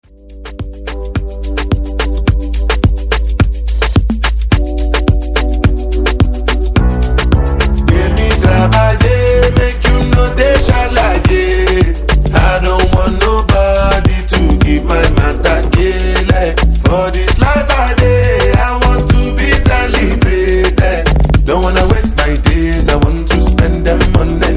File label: redrum breaker 107 bpm